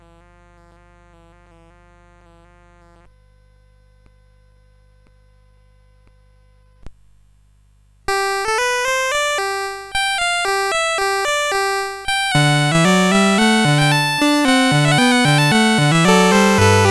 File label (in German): Fuge, CSG8580; CSG8580R5